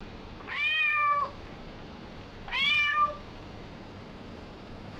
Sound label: single raspy cat meow; animals